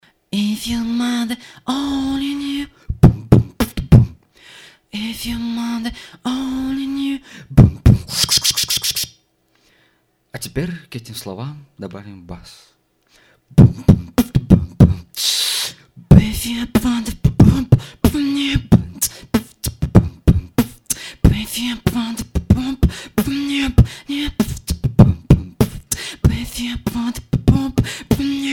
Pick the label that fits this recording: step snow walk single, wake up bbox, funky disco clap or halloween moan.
wake up bbox